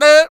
FEM PERC, Vocal Jazz FX

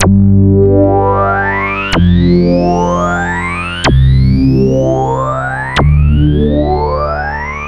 Lead

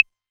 808 Clave, Novation Drumstation; Novation DrumstationV1

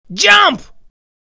Jump, Vocal Phrases; Ultimate Vocals

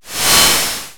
noise fx; Masterbits; Switch FX

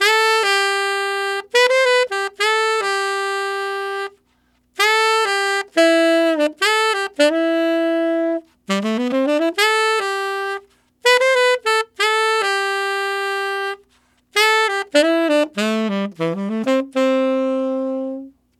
Tenor RnB in C maj, Tenor Sax Loops, Jazz; Sonic Foundry (Sony Creative Software); Jazz Solos and Sections

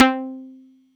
Pizzicato c3, Yamaha YX81Z

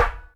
Shotgun, Electric Snare; Yamaha DTXpress; drum machine

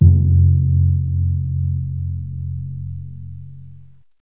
Timp, Timpani Dry; Roland LCDP03 Orchestral Perc

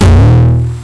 SUPER BEND, PHUZZ DRUMS; Spectrasonic Distorted Reality 1

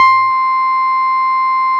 Guitar C5

